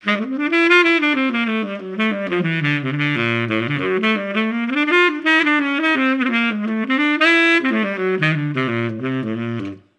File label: Tuba